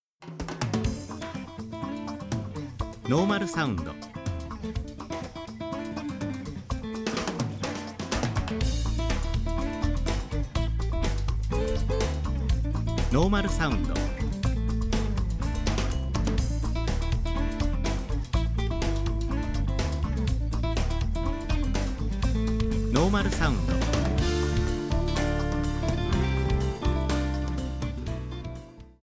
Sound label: m fusion normal